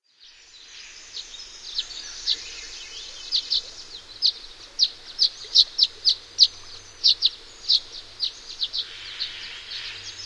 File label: Violet-green Swallow; North America; Common Western Species; Know Your Bird Sounds